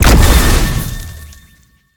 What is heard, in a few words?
projectile; smite; hera